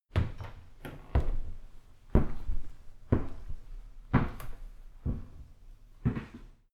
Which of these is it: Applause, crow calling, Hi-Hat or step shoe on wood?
step shoe on wood